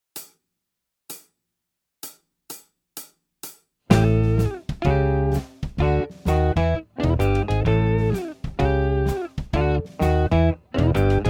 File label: Chicago Blues Guitar; Chicago Blues Guitar Lesson